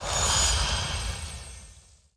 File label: Attack Underlay; King Phaoris